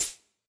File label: Gameplay Drag; bansonic; BANSONIC SFX